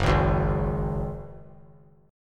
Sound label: Gb Suspended 2nd, 3C,3F,3A,3D; Gbsus2 strummed